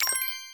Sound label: Take Coin